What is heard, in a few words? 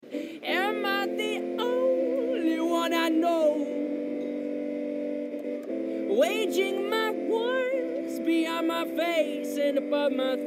Migraine Toy Piano